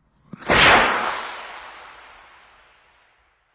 Solid Fuel Rocket: Launch And By. Stereo; small rockets

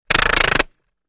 Silenced Machine Gun

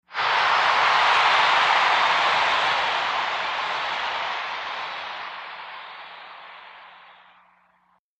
Concert Crowd Huge Cr, concert

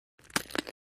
Bone Break; punches kicks hits bones

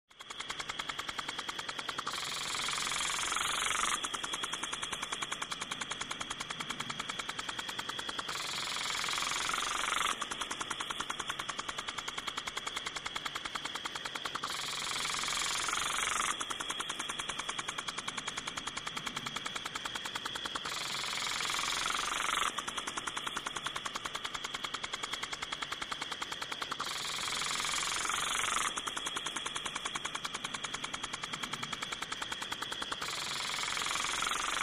Sprinkler Closeup

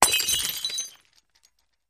Glass Breaks Light; windows & mirrors

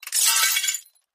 Glass Debris

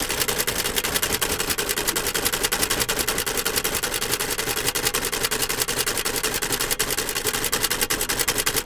Simulated Teletype; typewriters

